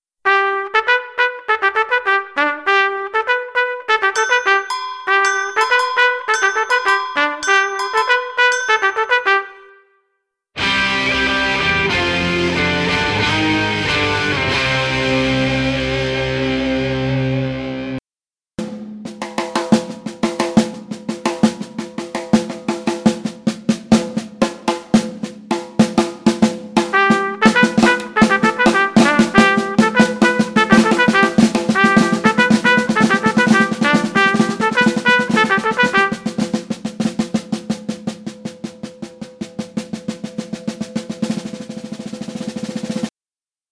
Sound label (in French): jeu d'écoute; petite histoire du jazz